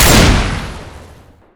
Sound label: shotgun fire incendiary; weapons, auto shotgun spas, gunfire